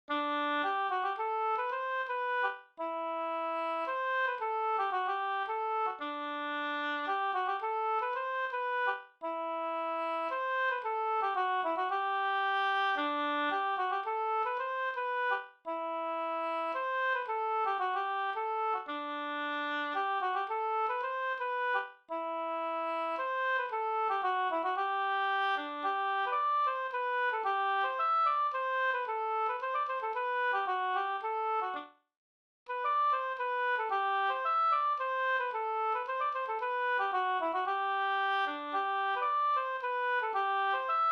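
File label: polska G major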